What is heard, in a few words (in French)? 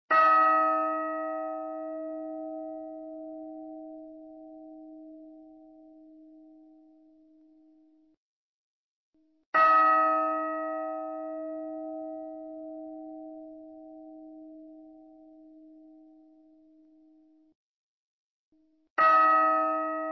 cloche